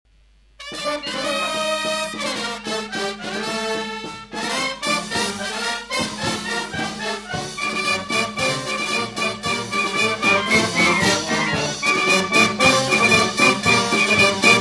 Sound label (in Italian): banda musicale; rassegna folklore; coro citta di trapani